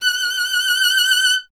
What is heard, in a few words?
STR VLN, Vln Detache; Miroslav Vitous